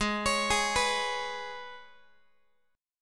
Ab Minor Double Flat 5th Ab, Guitar; arpeggiated; 4A,4E,5A,5D,5G#,6C#; JGuitar

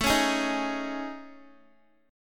A Major 7th Flat 5th, Guitar; AM7b5; strummed; x,12,13,13,15,x

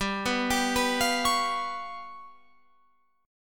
G 7th Flat 5th, Guitar, Open F; G7b5 arpeggiated

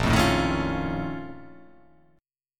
A sharp Minor Major 11th

